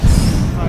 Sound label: shhh